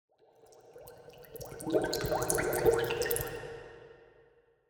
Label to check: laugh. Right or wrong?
Wrong